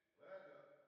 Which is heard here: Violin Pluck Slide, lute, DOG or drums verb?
drums verb